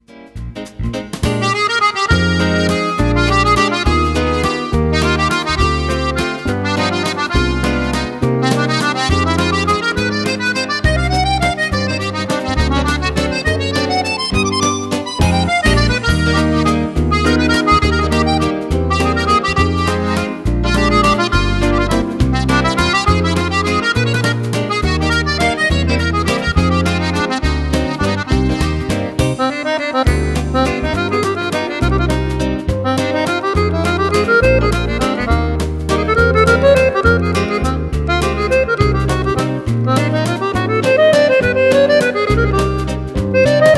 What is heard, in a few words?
Blue Musette